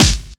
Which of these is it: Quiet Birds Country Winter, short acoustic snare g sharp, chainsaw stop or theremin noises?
short acoustic snare g sharp